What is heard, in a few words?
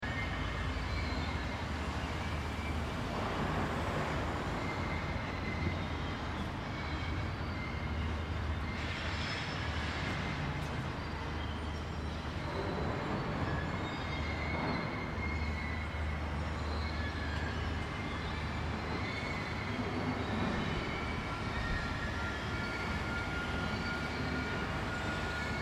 Construction Squeaks Thunder, Construction set with squeaks and squeals from crane; Good construction ambience. Light thunder from sky.; Construction Sound Effects